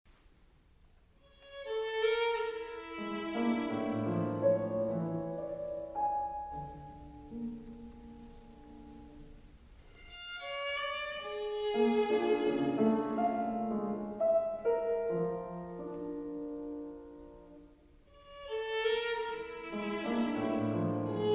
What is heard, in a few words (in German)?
Violine und Klavier; Drei Stuecke fuer Violine und Klavier, Nr. 1 (Anfang)